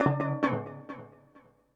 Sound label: ethno 125